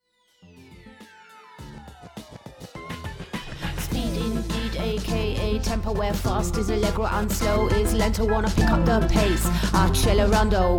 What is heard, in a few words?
fifths 2 rap